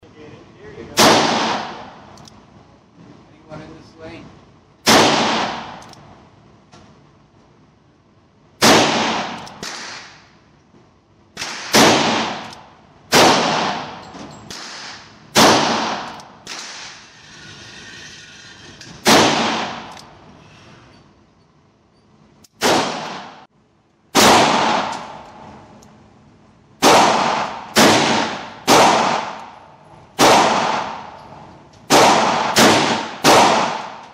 colt king cobra v tire

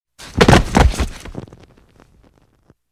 chelovek ostupaetsja i padaet opirajas na lokti

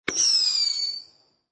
odinochnyj pisk vzleta; Звук салюта; Звук фейерверка; звук салюта победы

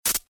pomeha v racii

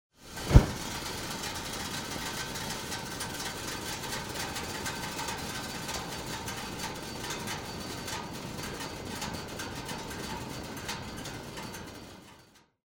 vkljuchili gorelku i podozhgli struju gaza teplyj vozduh nachal napolnjat shar

